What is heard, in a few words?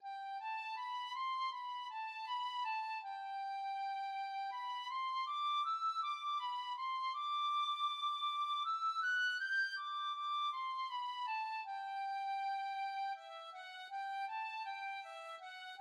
of the Father, tin whistle